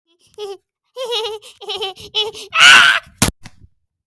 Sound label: hehehe ahhhhhhhhhhhhhh